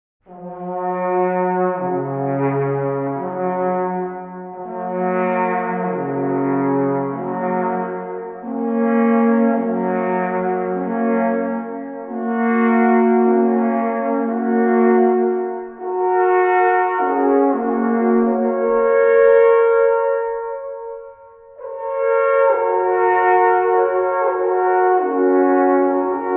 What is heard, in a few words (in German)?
Volksmusik